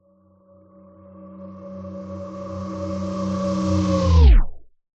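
sound design cinematic reversed build tension medium crescendo pitch descend end; zapsplat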